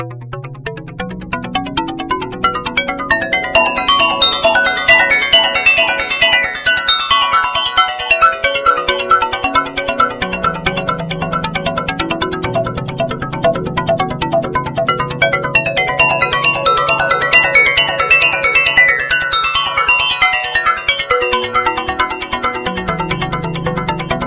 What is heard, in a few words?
Electronica